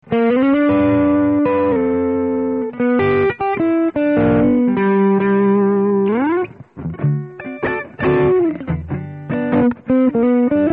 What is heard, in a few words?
gibson neck clean, pickups